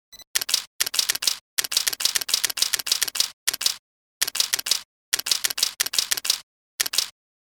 Bleeping and rapid clicking sound of SLR automatic digital camera shutter.; Electronics